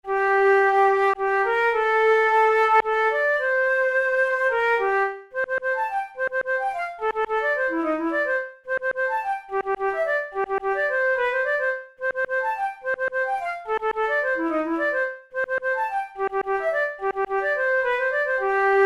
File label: burgmuller op100 la babillarde, flute